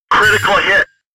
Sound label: critical chime